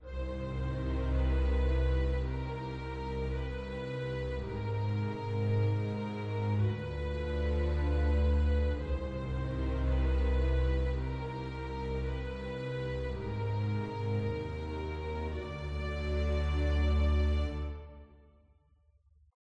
String; Sarco; tintin reporter cigars of the pharaoh

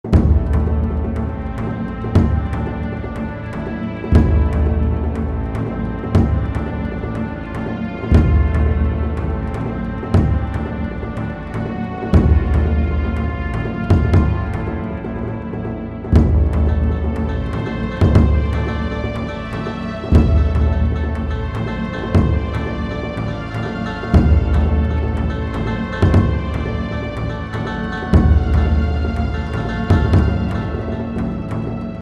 Chinese Kongfu; blade sword

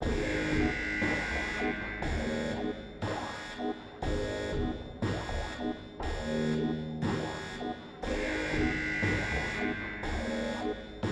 concertina alarm hop; Sogport Lab

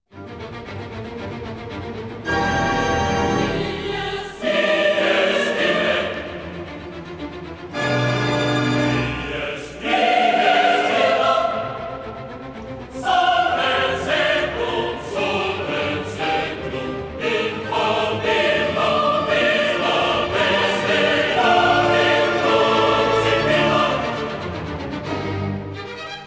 Requiem For Soloists Chorus Orch - Organ; Zombie Castle - Eggs; APM

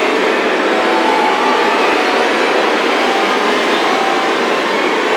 Looping Riser End

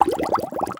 Cursor Harvest Bubble

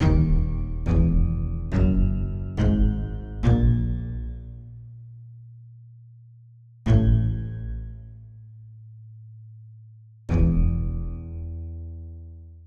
Mood Music

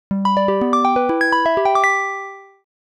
Floor Start Music; The Return of Ishtar

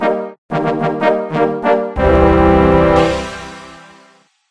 Hitpoints level up; Old School RuneScape; levels 50-99

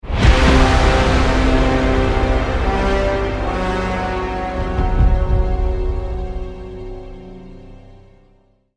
Death; Marlow Briggs and the Mask of Death

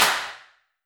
Clap; Squid Beatz

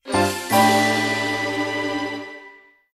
Stamp Collected; Wii Sports Resort

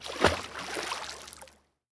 water splash

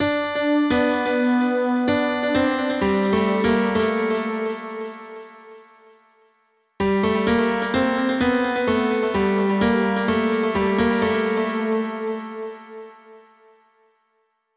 Melody

